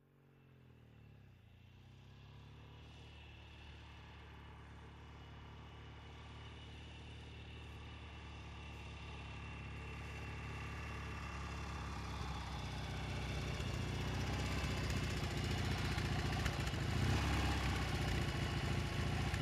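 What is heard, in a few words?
Motorcycle Norton Slow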